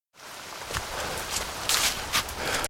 Energetic and slightly out of breath man hiking through rural countryside; Energetic and slightly out of breath man hiking through rural countryside and woodland in the rain.; Exercising; Human